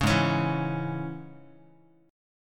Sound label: Ab Suspended 4th Sharp 5th, Guitar; strummed; JGuitar